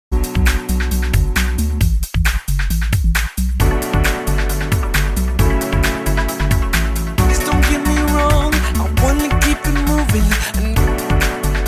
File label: Remix Ringtone